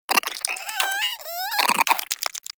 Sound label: Cryptilex Vocal Captured Idle, Hunting Critters; Characters; Warframe